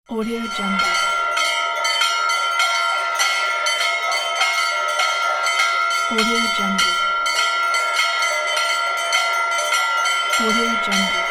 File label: indian temple bells